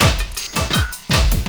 LOOP, 162 BPM, Drum & Bass

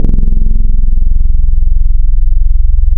Keyboarder, wavetables; Waves Codex

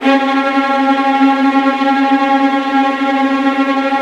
VIOLINT D#, Synclavier Strings; ILIO

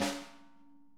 SNARE EDGE; Sonic Reality; Interactive Drum Kits; ID SNARE 7+

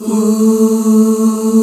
MIDDLE OOHS

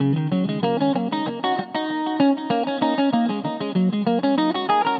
durga, stringed instruments; Sonic Foundry (Sony Creative Software); World Pop

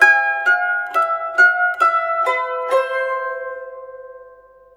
SITUR, SITUR PH; Spectrasonics; Heart of Asia

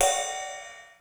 Ride, Korg DSS-1 Drums

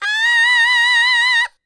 D BIT, Vocal R&B Dance

